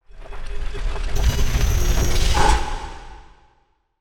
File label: Riser; Resound Design trailer featuring sounds crafted from my own samples